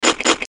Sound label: munch twice